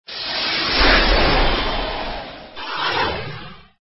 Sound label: Power Up, phonetones Other tones